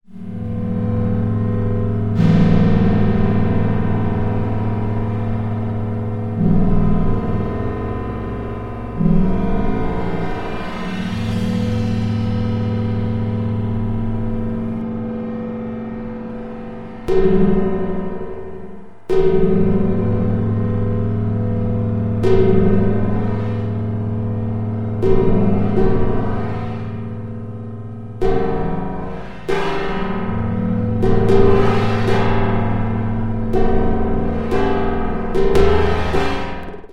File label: gong